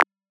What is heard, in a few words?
Shop click; Phasmophobia